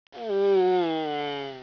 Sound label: death, yells